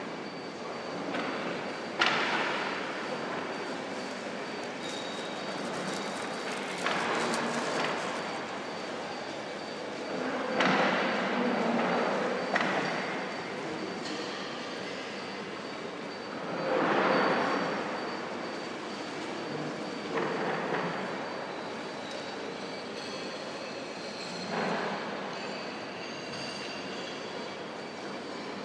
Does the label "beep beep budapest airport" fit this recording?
Yes